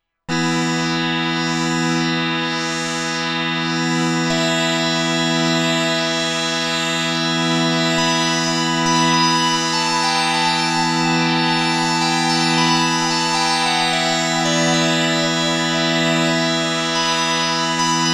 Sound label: Pad Multi Mod; Freds Lab Töörö